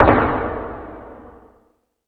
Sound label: FX Sounds, Drum Machines E-MU Pro-Cussion